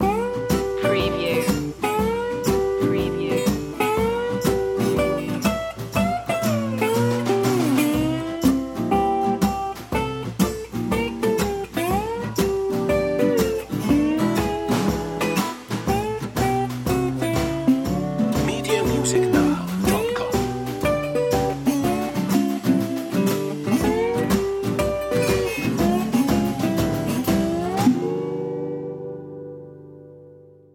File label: blues